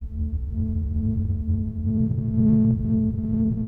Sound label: stormz, techno drum fx; Single Hits